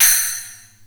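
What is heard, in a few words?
SPLASH, cymbal; 300 Drum Machines; Zoom RT-234; Kit C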